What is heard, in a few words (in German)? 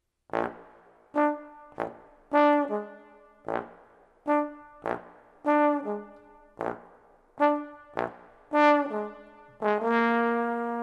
Blechfunk